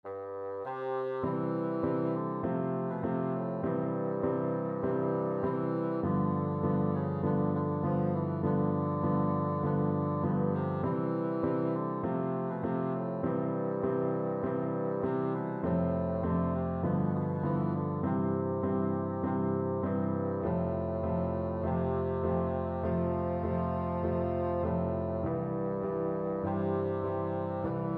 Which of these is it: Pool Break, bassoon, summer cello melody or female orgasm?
bassoon